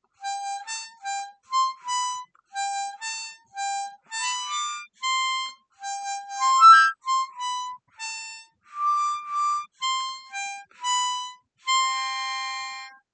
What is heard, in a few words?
clean, harmonica, Happy Birthday